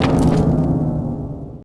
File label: Piano Play Broken, manor; max payne